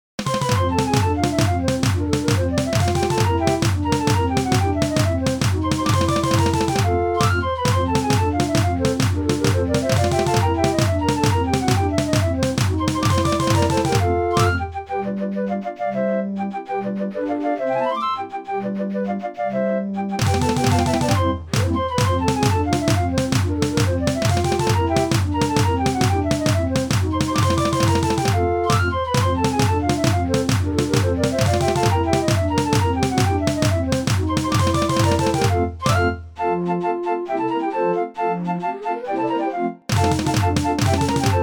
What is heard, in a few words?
Mexican Hat Dance, draaiorgels